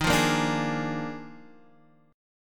Eb Major 7th Suspended 2nd Suspended 4th Eb Guitar Drop D; strummed; x,6,3,3,3,4; EbM7sus2sus4